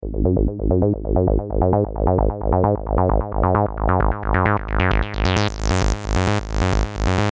132 G sharp m Bass

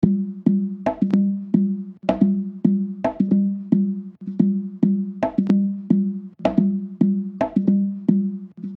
Perc 110

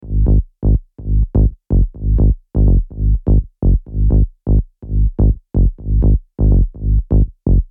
125 Bass Gm; Lambda

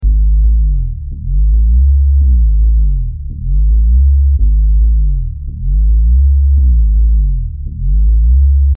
Bass Gm 110

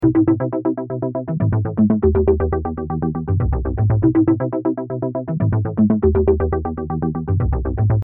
Synth, Em, 120

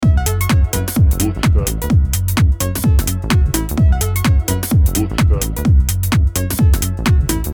128 Em Groove

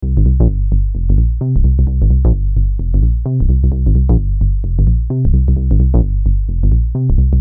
130 Cm Bass

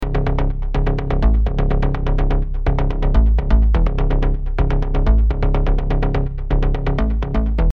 125 Cm Bass

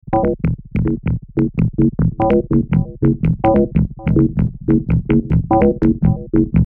145 F sharp m Bass

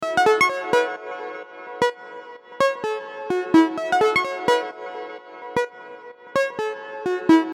128 Em Synth

